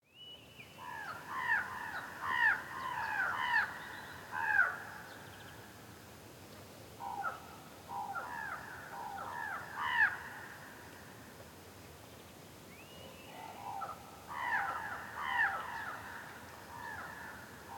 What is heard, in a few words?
Desert canyon quiet, light birds and wind, large reverb; Charlie Atanasyan; Jordan sound library by Faunethic